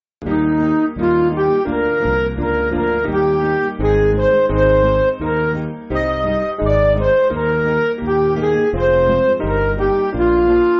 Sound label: Midi